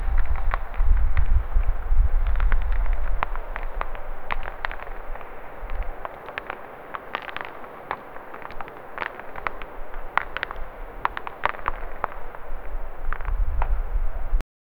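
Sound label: rocks falling from the top, mountain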